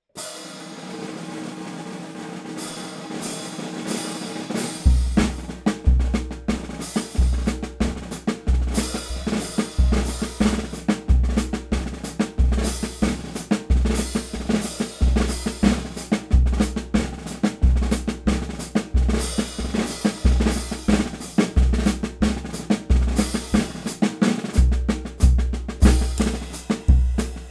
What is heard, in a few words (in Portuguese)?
bateria maracatu amaxixado